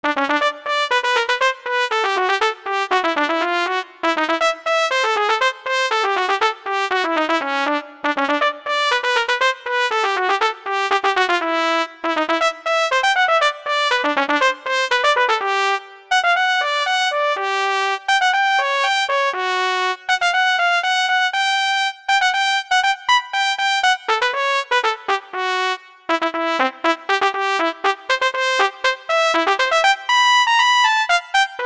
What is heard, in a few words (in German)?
Alpine Volksmusik Trompete